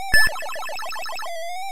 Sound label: Power Pill on Key Level